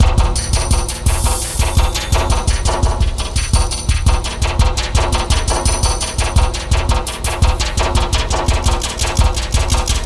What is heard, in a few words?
percussion dry